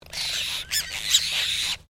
Bird Apostle Bird Group Call, Various Apostle birds; Animals